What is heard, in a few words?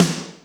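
Snare Bad Punch, Alesis D4 drum machine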